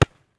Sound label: ball impact, rubber; physics